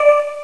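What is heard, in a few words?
MENU OPTION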